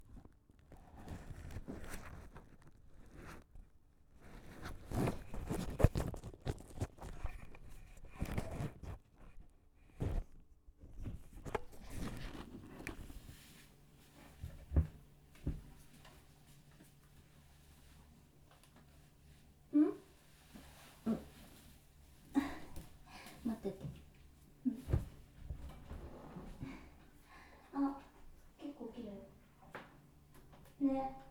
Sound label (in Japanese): 浴室; recorder